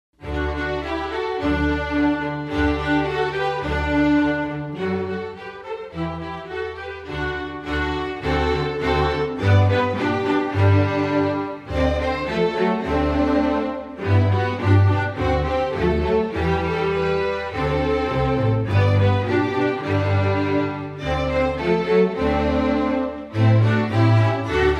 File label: Cello Boogie